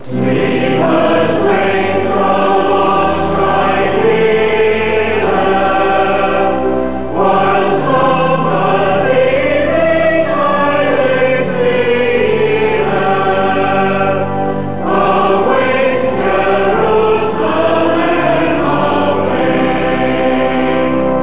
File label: choir